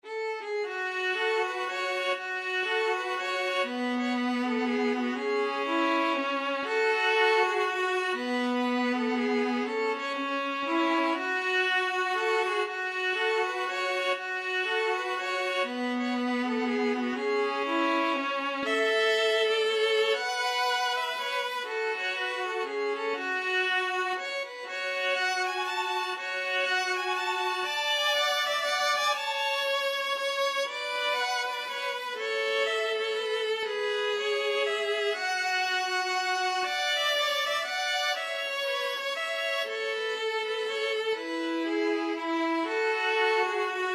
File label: violin viola duet